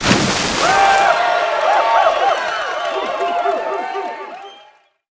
Splash And Cheer; Crowds

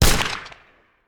Musket; Sound Ideas; Guns

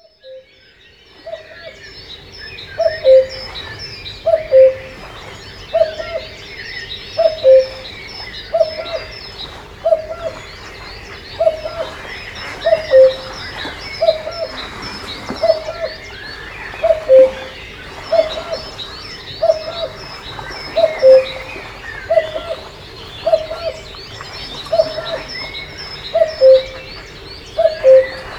birds sea cuckoo; best service Blue Box

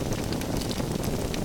Fire Torch Loop; Destruction

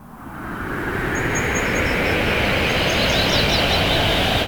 NOIS; XXL 800 Production Effects

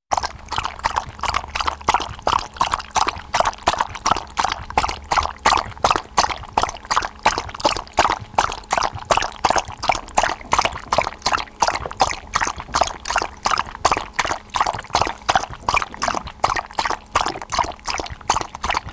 Walking Machinery Trucks

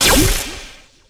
Scifi Forcefield Impact; Energy & Forcefields

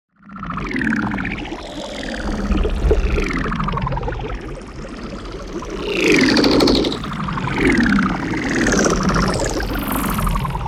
NEON PARTICLES LARGE SLOW; Liquid Voltage; boom library

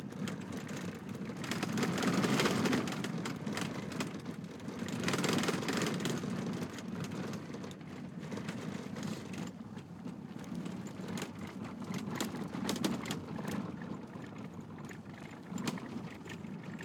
car suspension rattle loop; Blind Drive

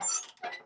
Creak Metal Sign Squeak; SFX Kit

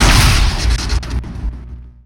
Explo Energy Fireball; Destruction

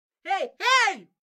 car reaction; Blind Drive

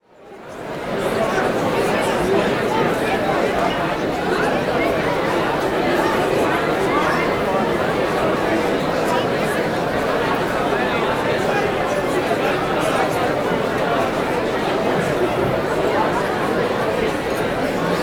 Crowd Indoor Busy Foyer, Walla; Sound Ideas; Walla Series

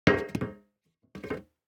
DICE on Metal, Throw and Roll, Foam, 1 One Die; Samples; ArticulatedSounds; DICE Sfx Party (Extended)

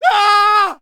npc male scream first; DeadFright

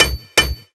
Blacksmith; Kilta

